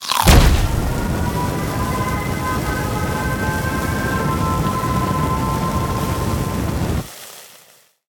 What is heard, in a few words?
Activate Chilli